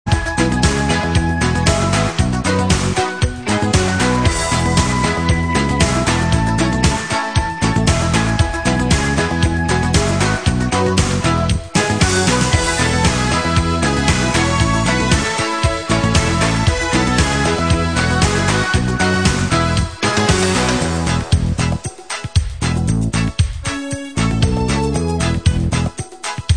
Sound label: instrumental